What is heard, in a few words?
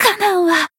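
Voice damaged, Japanese; hoozuki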